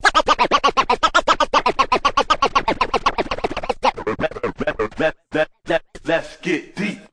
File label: lets get deep, Scratching and Turntablism; DRUM LOOPS and BREAKS